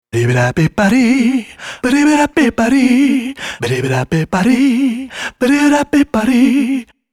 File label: Melody